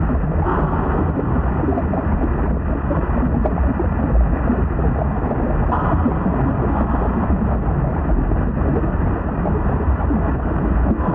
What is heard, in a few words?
birds